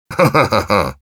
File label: Spy laugh short, ru